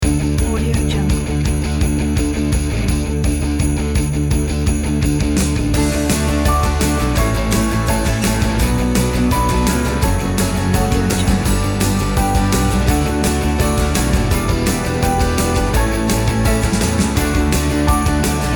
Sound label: rock and roll canon in d; st-tropezmedia